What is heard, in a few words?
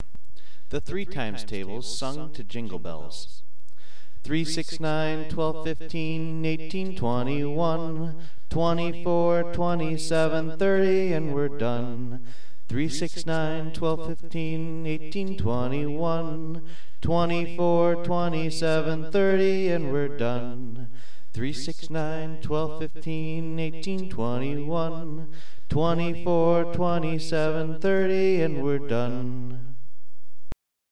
Jingle Bells